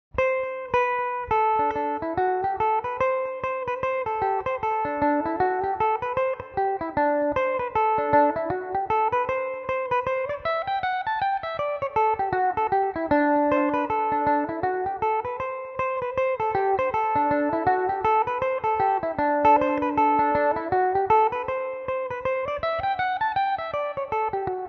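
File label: reel D mixolydian, irish mandolin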